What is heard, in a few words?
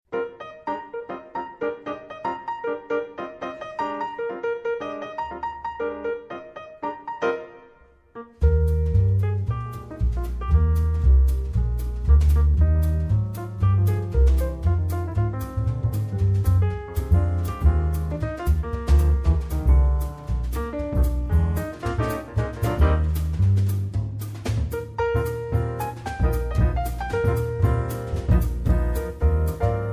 Jazz Trio; Indianapolis